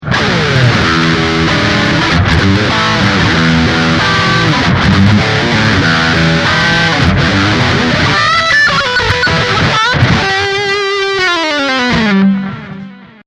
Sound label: Heavy Distortion Bridge Pic